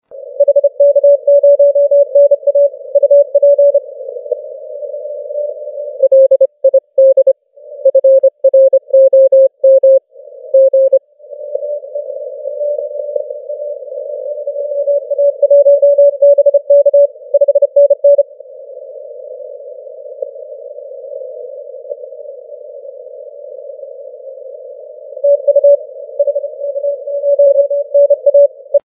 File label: 40m cw